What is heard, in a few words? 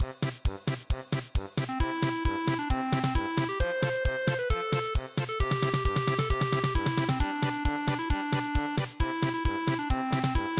polka